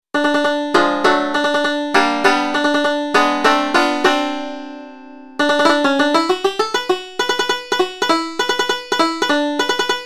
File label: La Plus Belle Polka